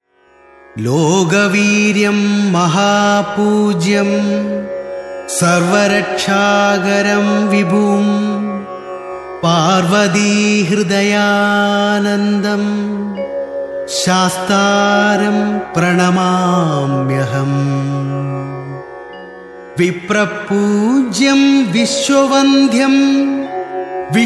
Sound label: Ayyappa Stuti Malayalam